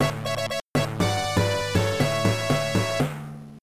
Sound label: 1-Up; sonic 1 halloween edition; sega genesis homebrew